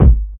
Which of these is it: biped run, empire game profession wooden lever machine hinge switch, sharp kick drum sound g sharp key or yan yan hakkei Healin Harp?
sharp kick drum sound g sharp key